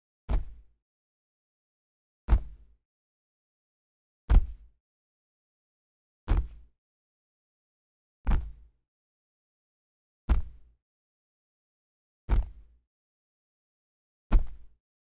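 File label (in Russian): стерео микс из 4 х топающих ног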